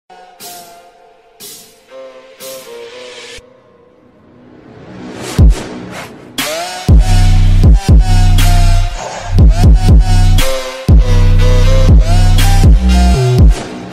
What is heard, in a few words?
bass boost headphones